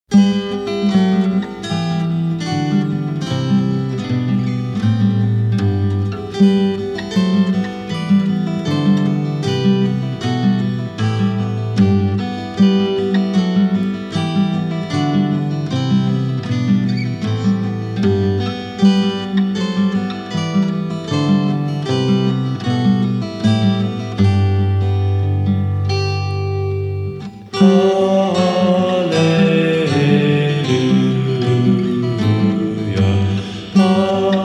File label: Halleluiah